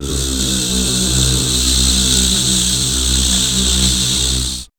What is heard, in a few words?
WEIRDOS, Voice Spectral; Best Service; Voice Spectral II